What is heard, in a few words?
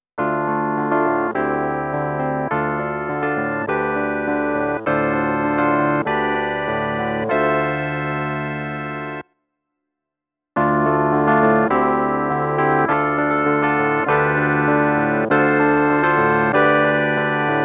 nw epiano dry then amp; Clavia Nord Wave